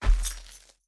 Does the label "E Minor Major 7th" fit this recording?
No